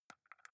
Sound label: console controller xbox arrow key press slow